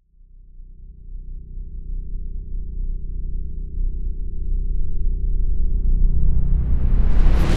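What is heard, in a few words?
Reverse Evil Showdown, Cinematic Metal Impacts; boom library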